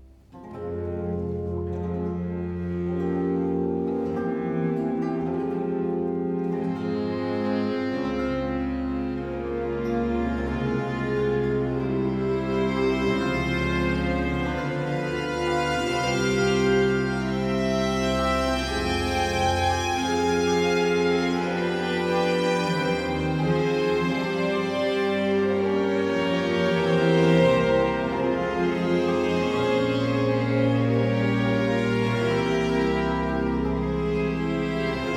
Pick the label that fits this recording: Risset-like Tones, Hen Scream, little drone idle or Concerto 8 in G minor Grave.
Concerto 8 in G minor Grave